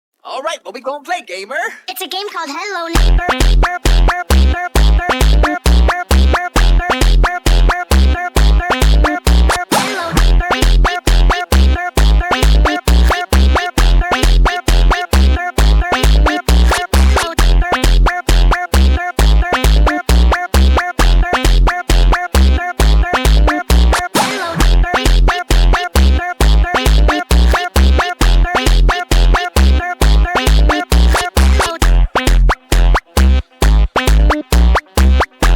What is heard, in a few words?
funk remix; hello neighbor